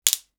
wood cracking; nature

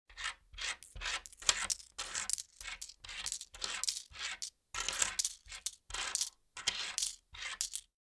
counting coins

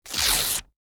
paper rip

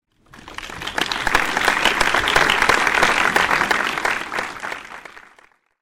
applause

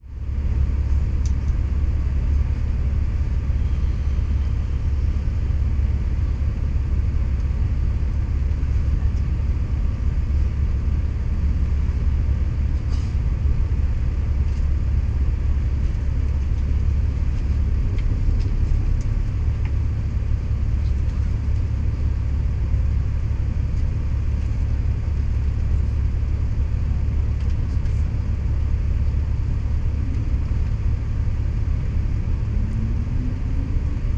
bus interior, ambient

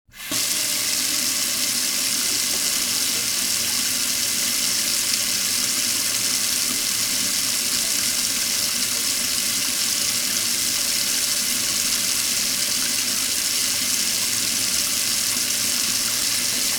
water faucet, kitchen

